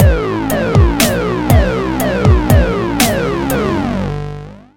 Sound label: Funky Beat